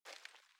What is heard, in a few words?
armour Light Step; Out of Reach: Treasure Royale